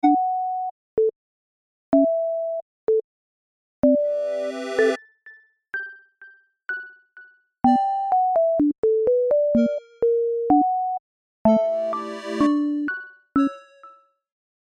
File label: house music